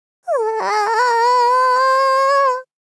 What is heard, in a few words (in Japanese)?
あああああああああ 少女×中音×高音域